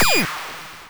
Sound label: wpn laser